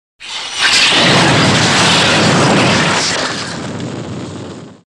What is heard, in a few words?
Jet; Take Off; Rocket Away From Aircraft.